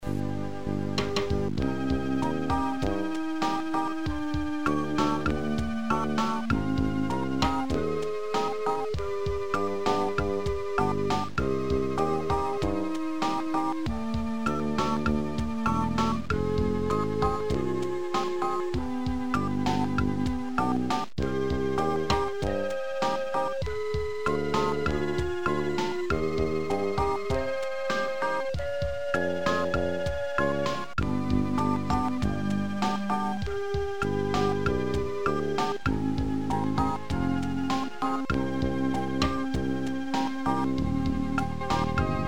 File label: Scabb cemetary